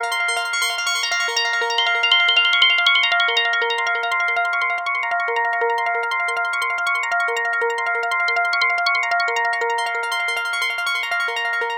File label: Arp; Sonic Foundry (Sony Creative Software); Slow Silhouette